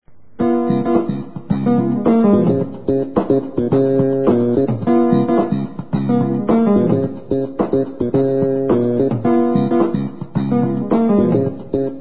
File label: Riff, Piano; Piano diary 2000